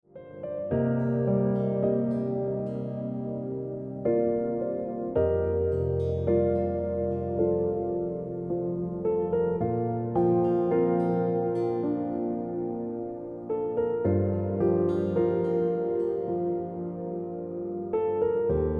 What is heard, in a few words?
Ringtone